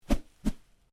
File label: Swish Double, Foley sounds for melee weapons; Combat Swing Sound Effects; Combat Swings (Sound Effects:Combat)